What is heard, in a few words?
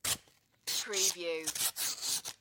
paper knife cut paper